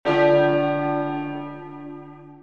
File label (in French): Clocher